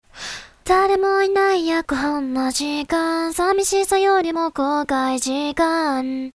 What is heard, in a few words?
noise Vocal